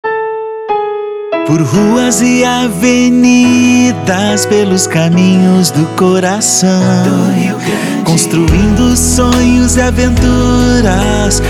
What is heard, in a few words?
Jingle